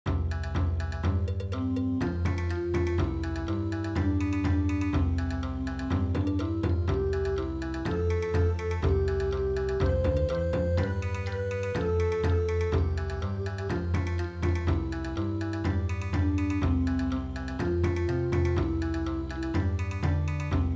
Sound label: Bon Odori, traditional